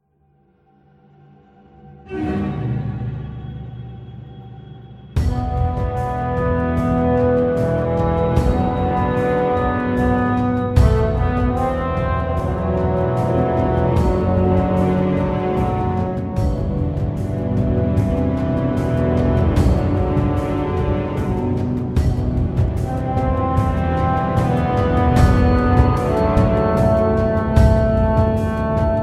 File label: Music laning; valve ti9